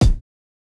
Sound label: kick, house